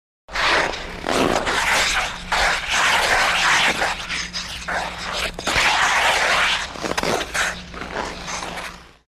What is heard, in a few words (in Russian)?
skolzhenie konkami o led